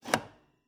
Cabin, outer doors, double doors, tension, latches, wooden, screens, slide bolt, hyper; Eiravaein Works; Vaeyan IV